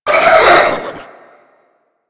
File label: Head Shake; Warframe; Stalker